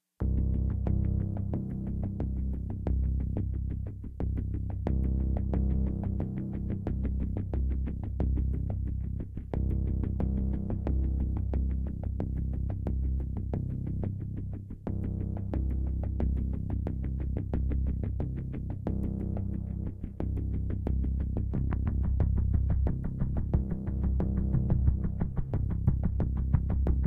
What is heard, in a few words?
Pulsebed